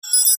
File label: infobox in; heros duty